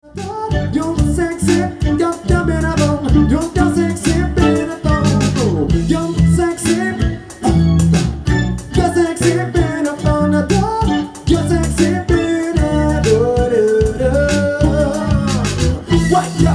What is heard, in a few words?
Reggae Dub Jealous